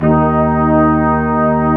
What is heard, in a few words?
BRS QUIN, Brass Quintet; Roland LCDP06 Brass Sections